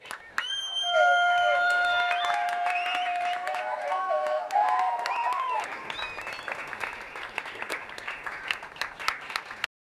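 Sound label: wedding celebration ambience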